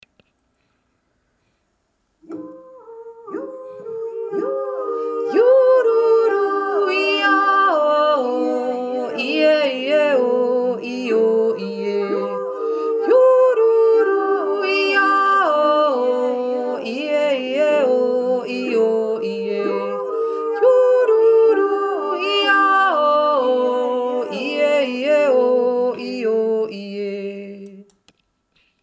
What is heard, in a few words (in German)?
pygm enjodler 4 st